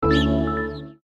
Tranquil close; Nokia; audio themes

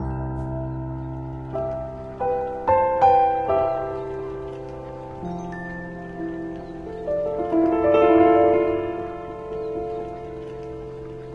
Forest Whispers, alarms; Honor MagicOS 7.1

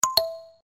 Down, notifications; Infinix; XOS 10

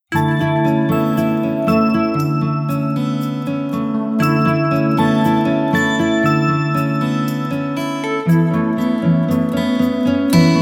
ringtong; Gionee V185

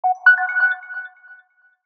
Alert; Turquoise; Telstra

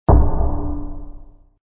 Klak Close, flip

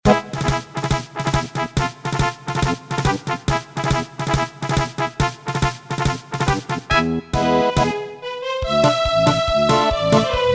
Spanish Bullfight Song, ringtones; ZTE F451

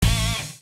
Punk close; Nokia; audio themes

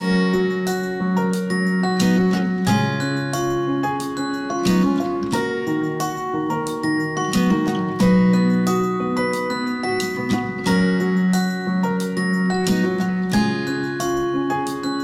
Melody, Ring

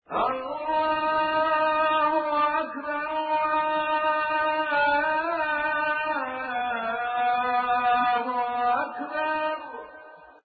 azan; Spreadtrum source code audio

